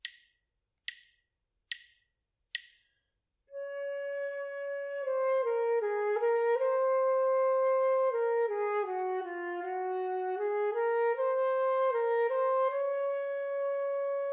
melodic dictation; eartraining

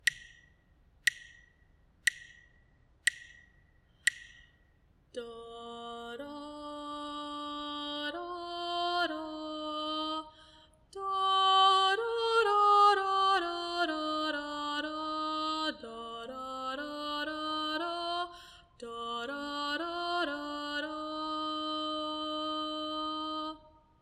melodic dictation; ear training

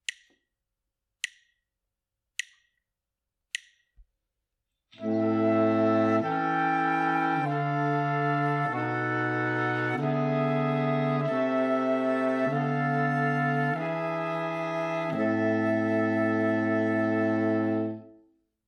harmonic dictation; eartraining

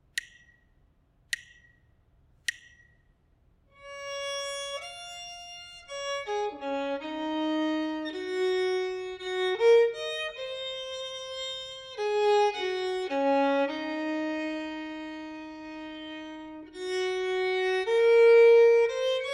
melodic error detection

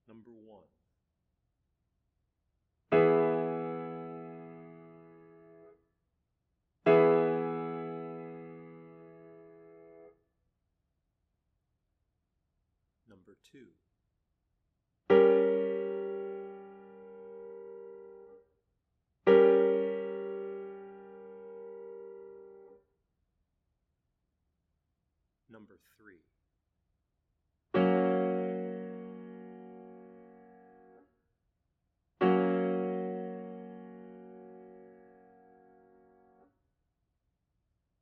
chords; eartraining

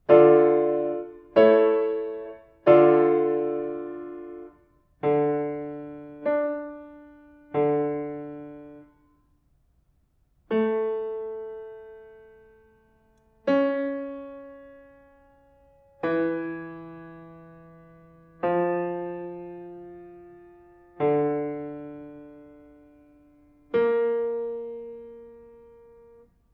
diatonic collections

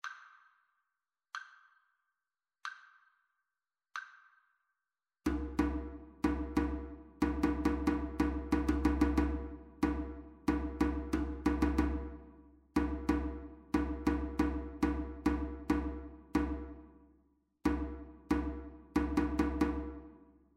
rhythmic dictation

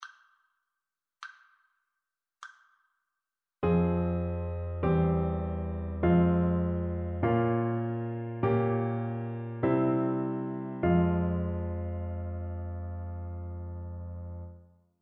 harmonic dictation

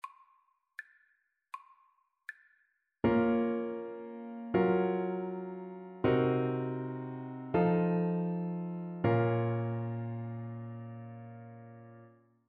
harmonic dictation

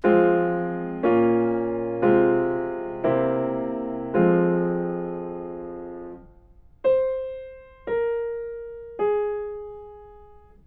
melodic fragments; ear training

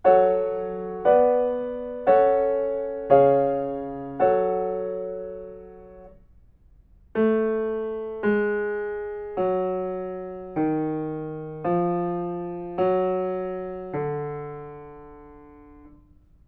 melodic fragments

